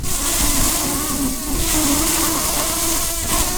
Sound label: bumblebees, Pascuorum queens